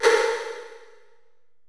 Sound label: 808 CRM, 480L; Wizoo; Platinum 24 Electronic; 480L MP 808